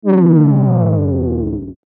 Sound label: Mouseover Buzz Descend Low